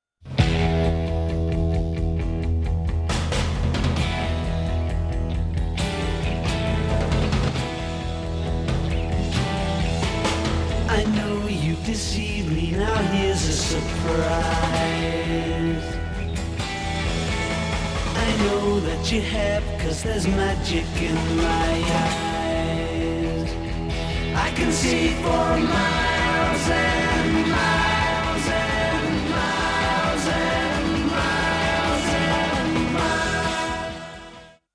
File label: pedal